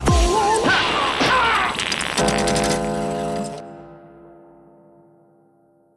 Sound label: game over; plumber soda